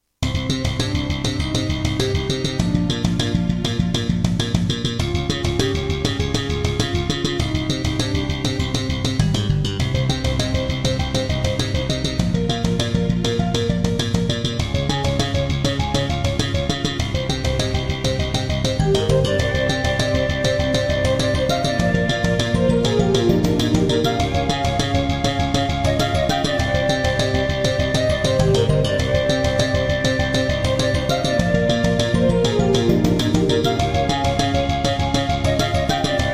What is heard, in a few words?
Roland SD-50 GM2 MODE; Introduction; Hocus Pocus